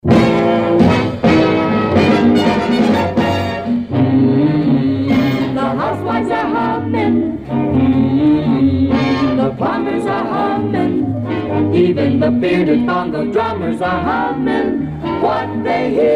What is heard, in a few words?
Housewives Are Hummin; WQAM; JingleMill